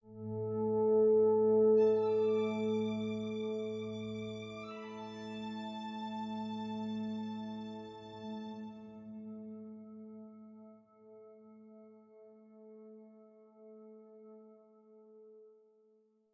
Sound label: ambiant